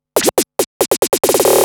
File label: Scratch Fills 140BPM